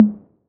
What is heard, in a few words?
808 HT Orig, Tom; Goldbaby Tape808 & Tape909